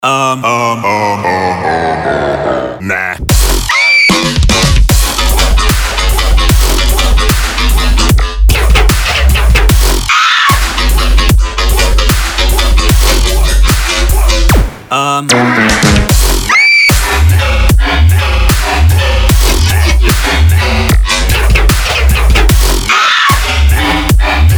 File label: big riddim mariachi